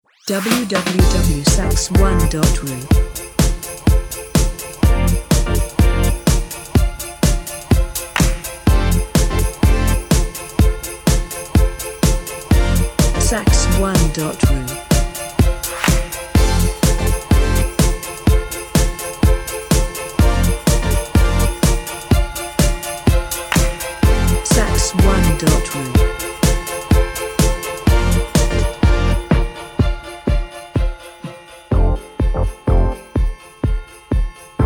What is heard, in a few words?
Synthetic sax Jingle Bells no sax